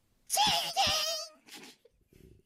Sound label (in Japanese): 自転車のベル, 声マネ; Miko; 声マネキング; 声マネキング対決; フブみこさん; ホロライブ; さくらみこ